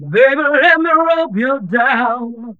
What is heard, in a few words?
Funky Vocals; USB Soundscan vol.18; AKAI